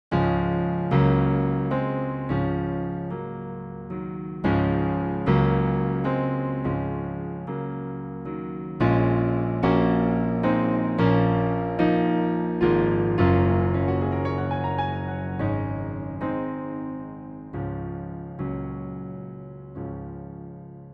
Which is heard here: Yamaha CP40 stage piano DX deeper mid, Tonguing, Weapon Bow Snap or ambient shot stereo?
Yamaha CP40 stage piano DX deeper mid